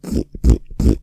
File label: Pig grunting